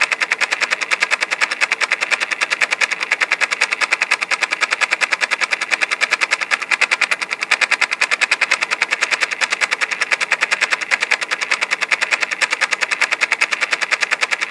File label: Scope GUI Countdown; moul